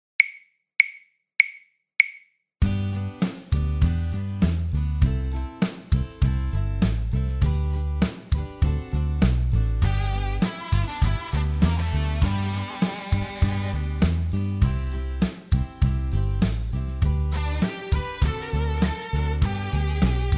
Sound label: Rock Duo Backing Track